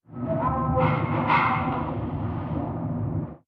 ambient port default; World Of Warships

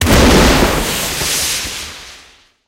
tank rocket shot; cannons; War Thunder

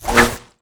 baton swing

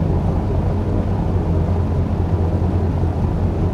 an2 cockpit, engines; War Thunder

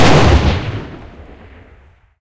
mgc30l last shot, guns; War Thunder

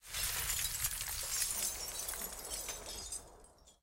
explosive glass layer; War Thunder

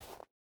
footstep hide snow jump start; Rust

